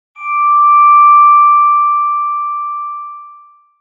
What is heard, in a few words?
bell bing